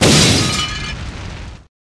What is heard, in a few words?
make potion botch; schattenlander